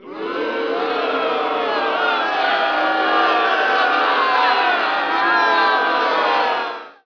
crowd boos

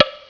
POP

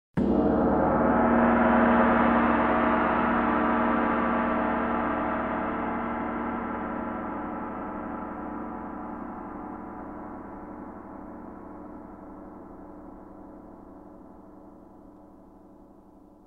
Four Gongs; Media Music Ltd; Short Cuts From Around The World

